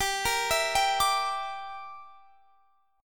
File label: A sharp 6th Flat 5th